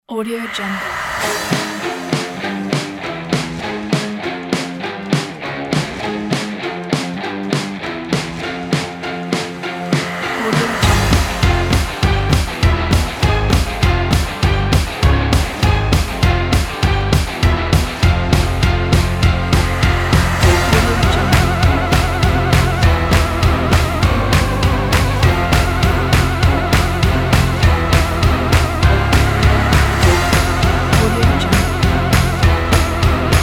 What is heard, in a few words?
dark and fun halloween